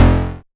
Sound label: Bass, Synths, 5U Modular; Polyend; Simulacrum Sounds; 1 Shots